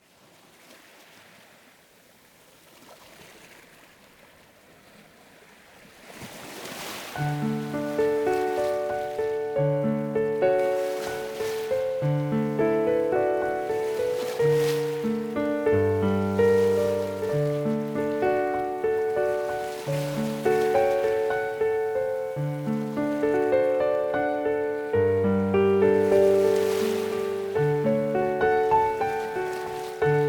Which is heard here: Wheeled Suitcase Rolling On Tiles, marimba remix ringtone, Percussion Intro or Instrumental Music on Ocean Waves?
Instrumental Music on Ocean Waves